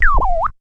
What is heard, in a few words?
radio melody; Radio FM synth type stuff